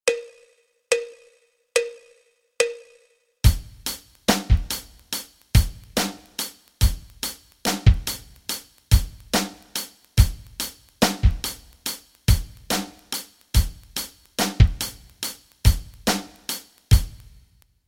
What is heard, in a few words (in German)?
rock rhythmen